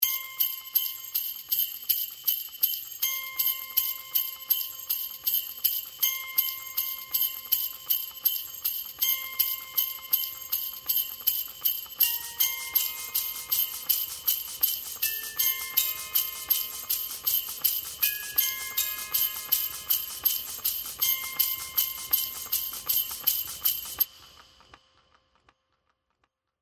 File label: Sleigh Bells Ringtone; HTC